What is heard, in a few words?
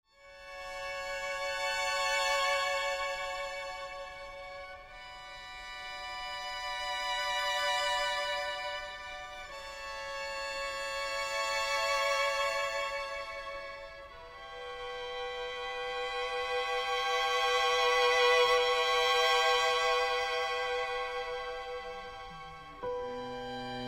with piano, String Quartet, Tpt & Oboe; string quartet tpt oboe session